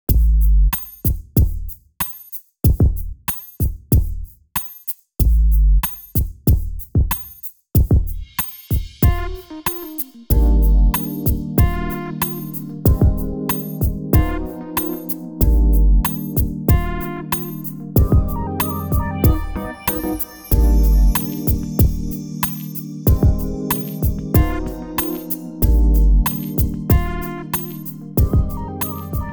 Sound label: Fireside, Jingles